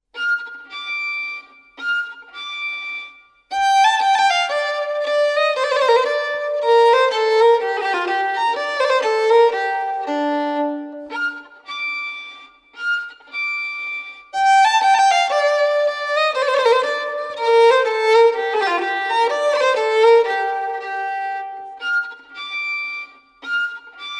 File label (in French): Polska du coucou solo; Musique de Suède